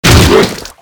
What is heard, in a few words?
boomer death; cso